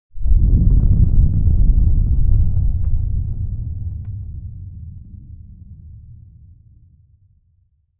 massive stratovolcano eru, eruption

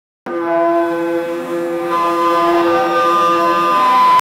sound for youtube shorts, marine

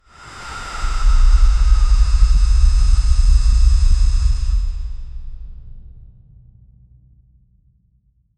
slow breath energy charge

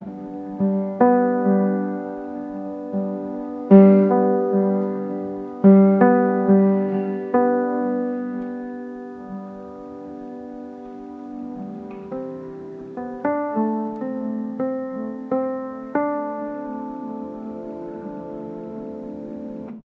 piano and guitar sound; instrument

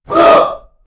orc death sound like in, orc

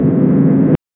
airplane adding thrust; aircraft

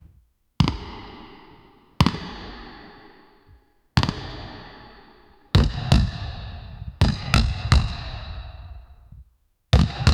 basketball ball rolls

